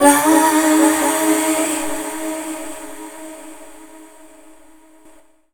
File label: FEMALE, Dance Vocals